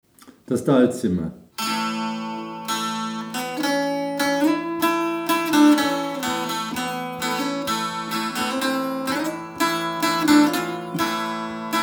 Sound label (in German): Dulzimer